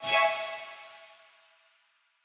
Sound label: Quasar Clear B; Mixolumia